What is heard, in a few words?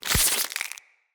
Root Spider Foley Jump; Animals